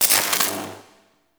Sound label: Elec Spark; Ambience; Props; Warframe; Nokko Colony